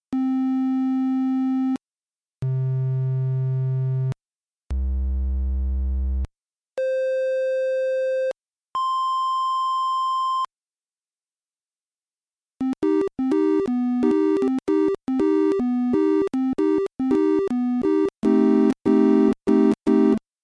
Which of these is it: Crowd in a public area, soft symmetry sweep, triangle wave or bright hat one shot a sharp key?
triangle wave